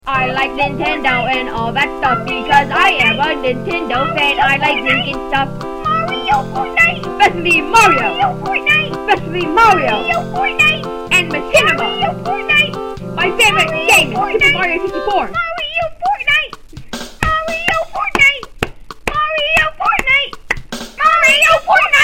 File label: music